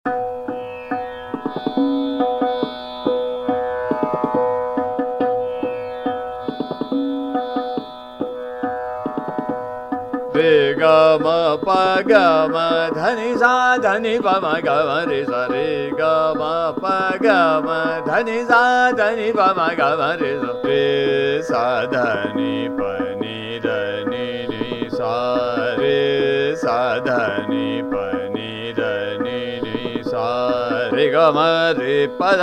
Sargam Re Ga Ma Pa Ga Ma Ektaal Drut, Ramdasi Malhar